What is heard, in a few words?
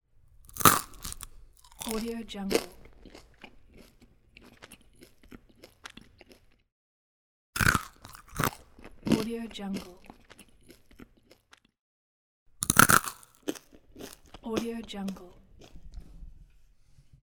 crunchy bite and chewing